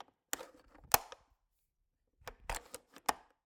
Cabin, window, latch, flip, lock, open close, wide; Eiravaein Works; Vaeyan IV